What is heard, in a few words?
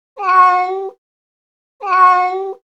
mcat idle; STALKER; customnpcs